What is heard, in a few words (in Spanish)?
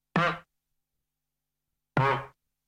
golpe